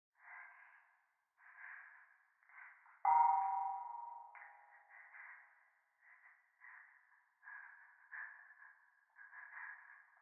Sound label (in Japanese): 教会 右耳快感吐息 寺